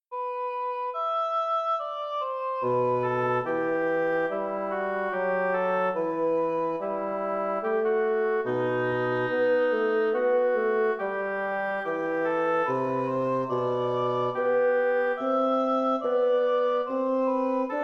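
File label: minuet in E minor woodwind duet; purcell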